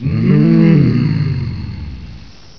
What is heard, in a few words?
kill; svencoop; hunger; hungerbarney